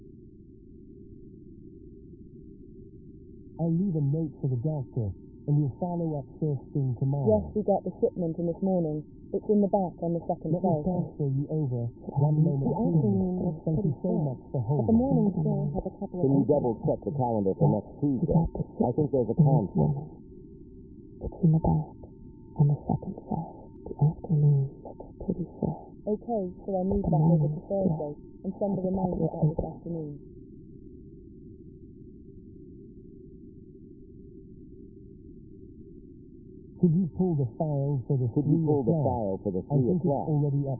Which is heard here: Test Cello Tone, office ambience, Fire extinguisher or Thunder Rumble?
office ambience